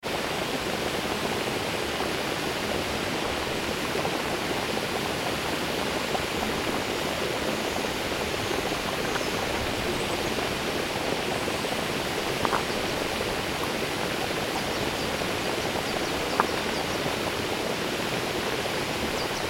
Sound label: hot spring